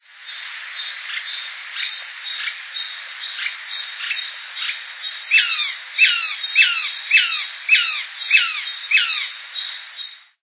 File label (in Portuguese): abre asas da capoeira